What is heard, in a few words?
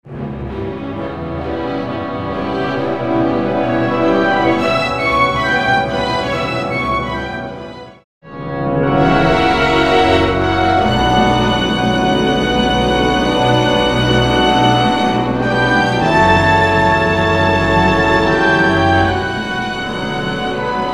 full orch accomp; Madama Butterfly